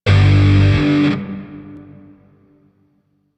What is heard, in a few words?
Shawzin Chromatic Chord E; Grineer; Warframe